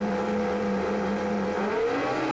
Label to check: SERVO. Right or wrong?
Right